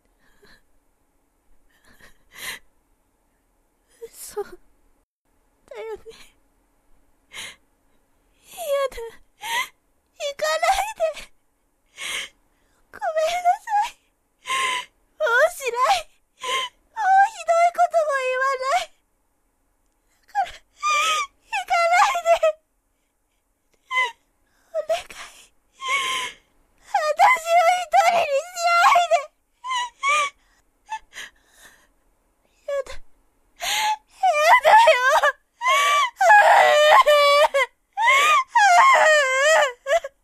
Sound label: cry, Vocal; 演技; 泣く